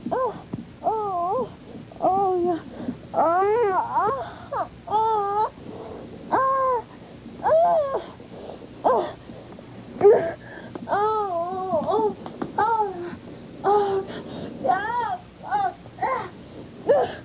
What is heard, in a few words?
oh yeah, Silly Lo-Fi Orgasms, VOCALS and SPOKEN WORD